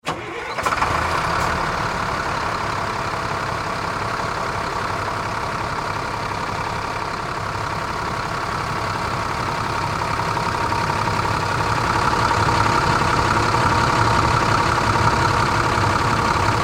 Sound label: Fire Truck Start Up Idle Shut Off; Emergency Vehicles